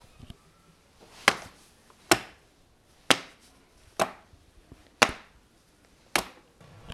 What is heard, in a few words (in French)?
anneaux de classeur; Les ecoles